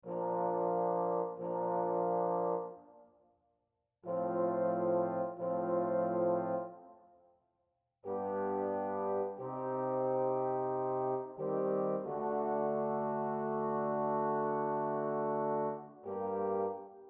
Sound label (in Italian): Tre Eguali Per Quattro Tromboni; Beethoven; WoO030; Eguale2